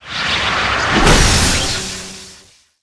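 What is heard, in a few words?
Attack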